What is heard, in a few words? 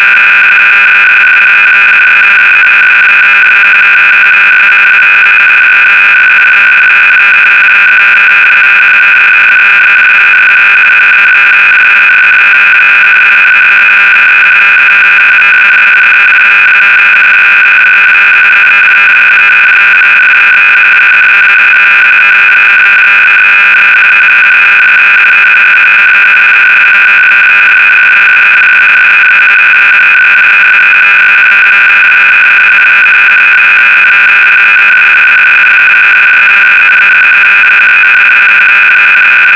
sine